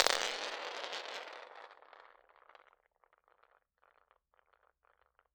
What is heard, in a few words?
Roll On Floor, Medium Wine Glass